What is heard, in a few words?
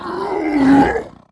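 Dinosaurs; Dinorsaurs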